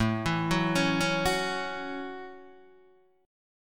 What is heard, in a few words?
A 6th, Guitar, DADGAD